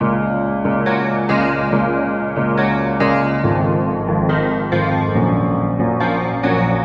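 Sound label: Melody Loop D# minor 140BPM, Dubstep; Murk; Ghosthack; Dubstep Sounds 2025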